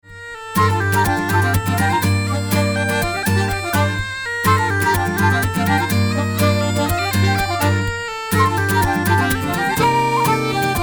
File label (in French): Bourrée, Bourrée; Bourrée de l'Eouve; Bourrée d'Isly